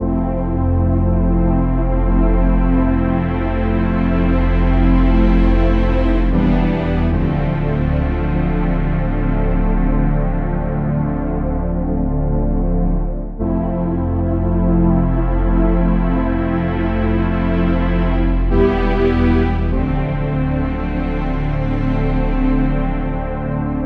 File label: warm strings